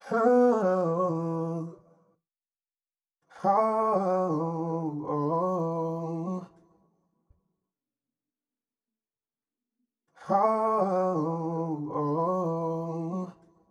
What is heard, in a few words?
Vocal Loop - 140 BPM B Min; Downtown